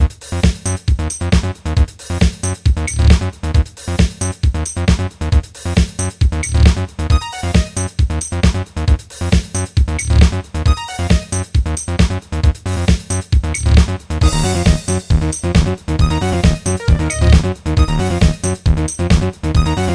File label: boss battle